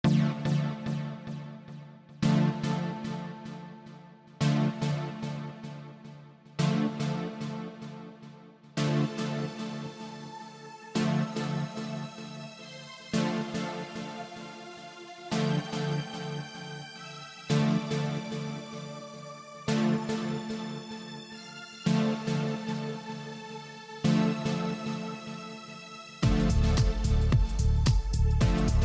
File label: music dance; jump on dance floor